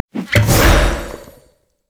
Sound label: drunken rage; LoL; gragas